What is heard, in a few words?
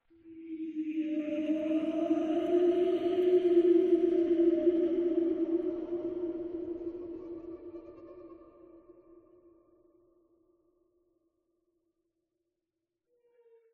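random laughs; ambient; Half Life Alyx; xen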